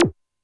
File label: Big Blip, Drum Machines Alesis D4